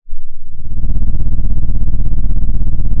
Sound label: pop, Sound of an engine revving